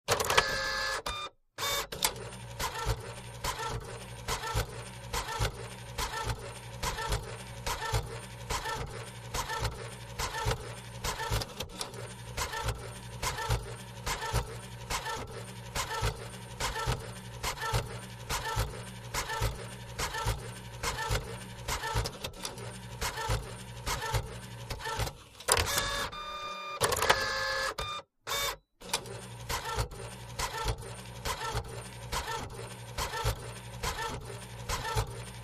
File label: Computer Printer Ink; fax scanner copier